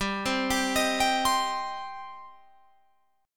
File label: G Suspended 4th Sharp 5th, Guitar Open F; Gsus4#5 arpeggiated; 19,19,19,22,22,19